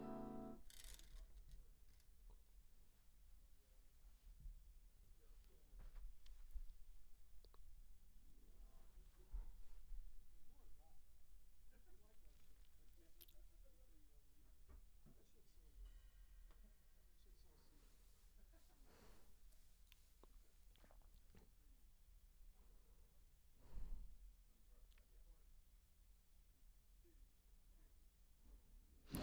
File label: Ld VOX SM7